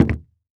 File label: Foley Footstep Golf Cleats Single Step On Solid Wood Platform; Foley Footsteps